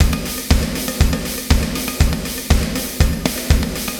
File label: 120 POWER, drums